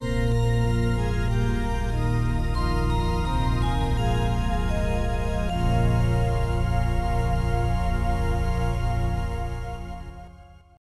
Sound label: Death (Game Over); Strider 2; arcade